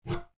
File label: cabinet door open